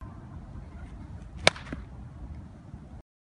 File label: Hitting A Finnish Baseball